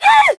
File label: HIT; BattleSNDS